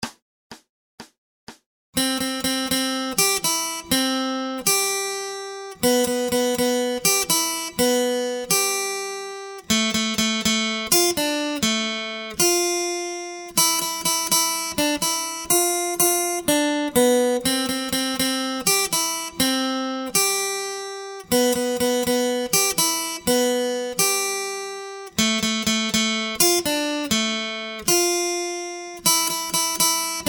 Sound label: Acoustic Accompaniment, acoustic guitar